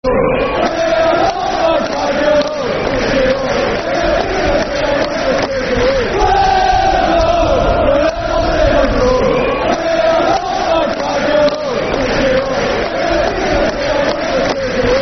We'll Go Wherever St Mirren Go [Football Chant (Soccer Song)]; St Mirren Smfc